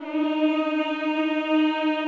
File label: CHO, Mmhh; Sound & Vision; Gigapack I CD 2; CHO Mmhh + Rrrr